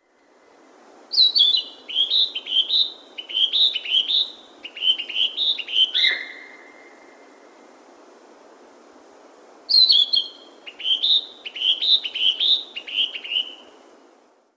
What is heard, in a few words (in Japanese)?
kibitaki, singing